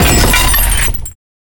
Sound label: crash glass